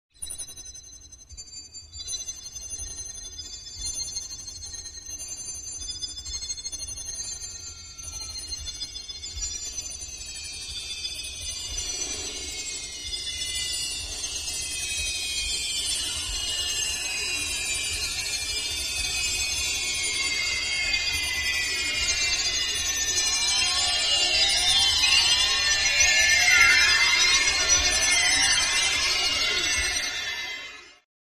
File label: Multi-pitched, High Frequency Transformation Sequence; Multiple Tones And Bells Oscillate.; Synth Tones